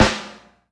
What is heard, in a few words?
wet snare drum a key